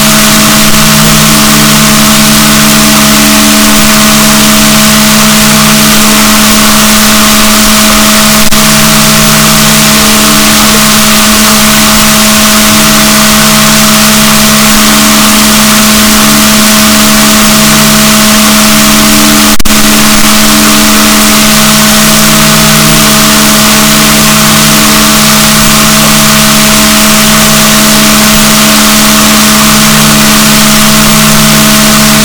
rorquals negatif, boat

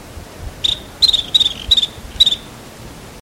bird

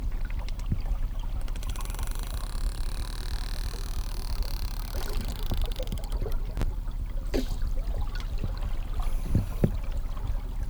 Physeter stretched; JASON 600kHz; UTLN CNRS; click identifications

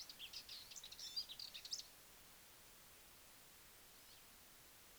birds; nips4b2013

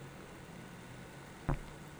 pulses, BALAENOPTERA; MONACO 2022-07

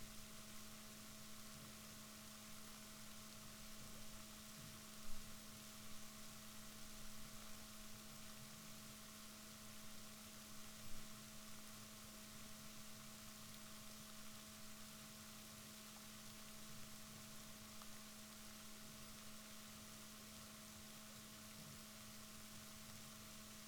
rorquals negatif, Balaenoptera Physalus Chile

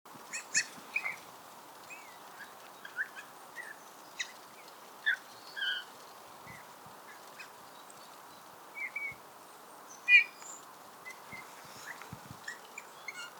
Pyrrhula pyrrhula; SETE

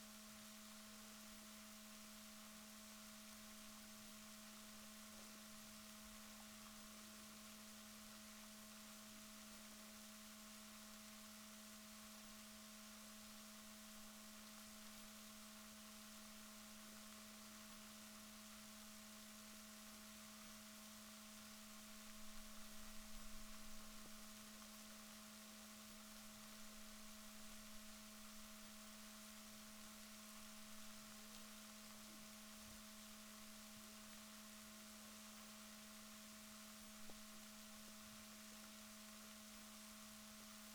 rorquals positif, Balaenoptera Physalus

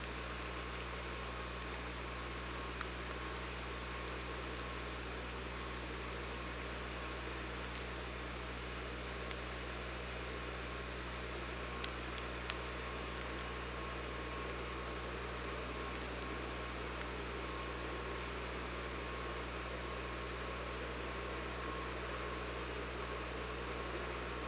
OrcaLab

